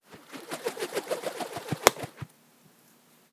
Twirl But Ben Hits Himself Halfway Through, Organic Wooshes; Micro Pack